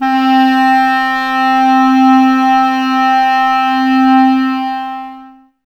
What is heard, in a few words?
clarinets, Wood Winds